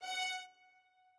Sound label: strings